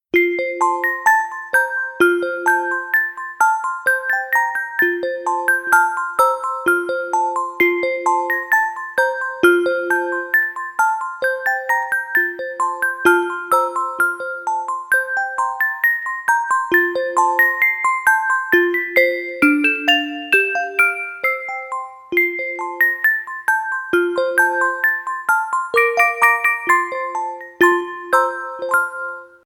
Deck the Halls (bells); christmas

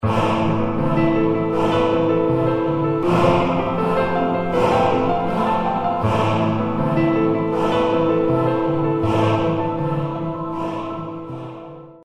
jingle

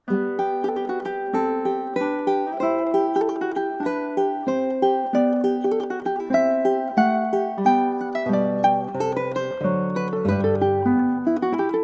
Preludium, Guitar and Ukulele; Partita No.1 BMV 825; JS Bach

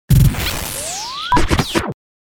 Fizzle Bounce; DJ sounds

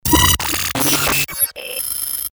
Transtatic Pellet; Best Of N2O Library; Breakers & Transitioners

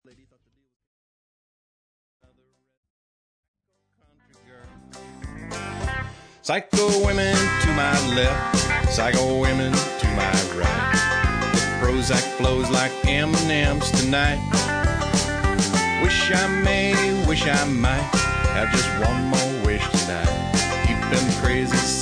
Psycho Women!; SOUND EFFECTS1

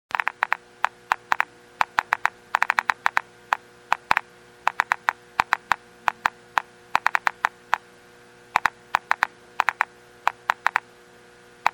Geiger Counter; SOUND EFFECTS1

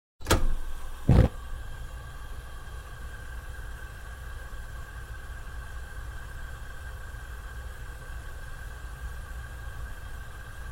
Gas Stove Turn on Ignite & Turn Off, Household

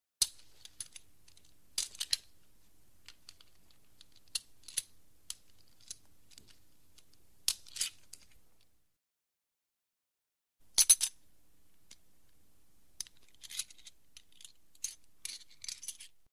Coins Light Distant Jingle; Hollywood Edge Foley Sound Library

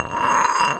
IRONWARE, IRON WARE HIT; 1000fx

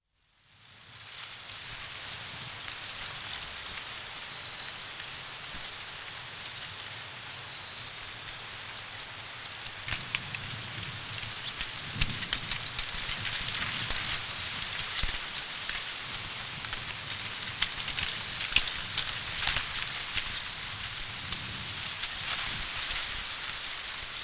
Light Autumn Rain On the Farm, Nature Sounds; Dr. Sound Effects; 210 Nature Sounds - 20 Hours of Relaxing Natural Ambiences for Meditation and Sleep

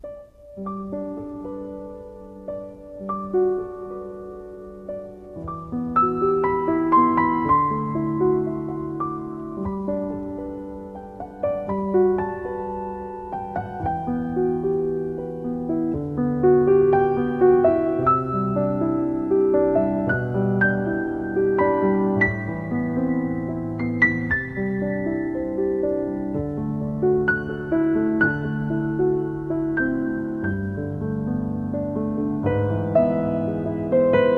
Harvest Moon (Piano Reprise)

